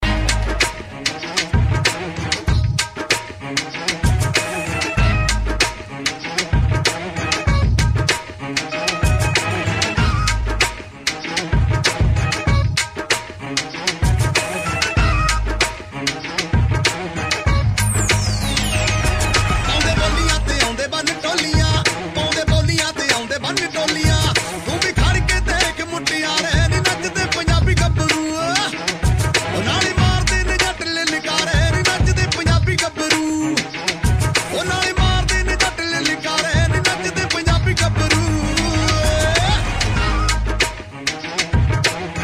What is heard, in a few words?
Punjabi; UK Punjabi